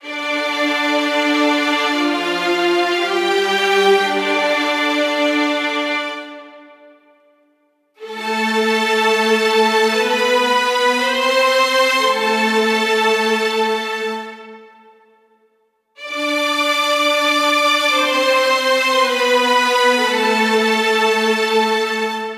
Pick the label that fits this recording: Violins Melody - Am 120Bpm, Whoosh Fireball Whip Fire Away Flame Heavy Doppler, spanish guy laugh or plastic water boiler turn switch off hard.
Violins Melody - Am 120Bpm